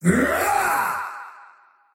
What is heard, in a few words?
attack big; dota; faceless void